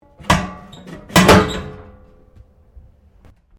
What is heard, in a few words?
Train Carriages 1937 Folding Basin Close